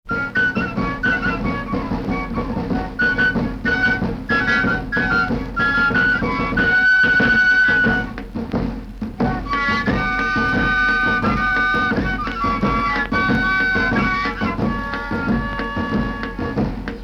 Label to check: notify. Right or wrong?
Wrong